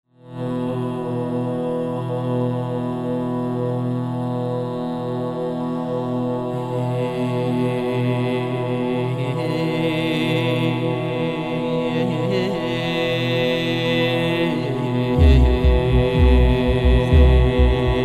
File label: Vocal Shoor; Vocal&Effect